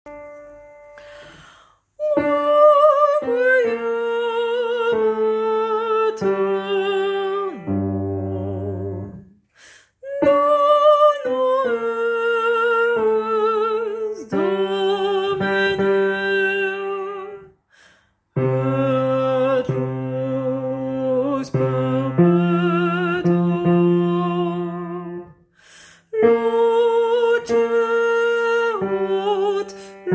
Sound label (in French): partie basse; Graduale Requiem